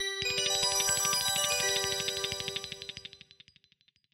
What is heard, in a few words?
Shuffle